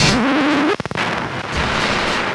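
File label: scratch, record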